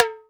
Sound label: TOM, DRY KIT; AKAI S6000 CD-ROM - Volume 1; DRUM RYTHM